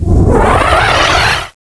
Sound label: forcefield; ambience